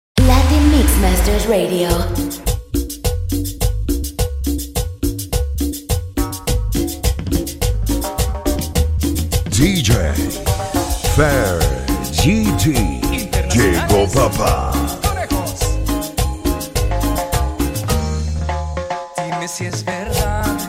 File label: MARIMBA MIX